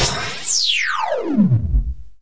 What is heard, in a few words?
ufo megahit, Electronic Hits